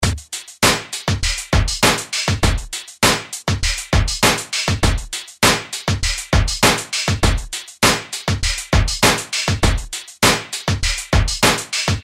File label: rhythm industrial; Sound Graph